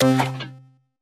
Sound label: game show tile flip